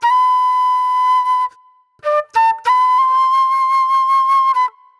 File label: Flute